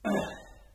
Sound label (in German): fitness stoehn; minecraft